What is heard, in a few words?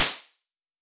Frozen Room, Ambience and Small Rooms; Ableton; Convolution Reverb